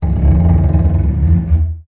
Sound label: TALK BOW, BASS FINGER; Xsample VOL-5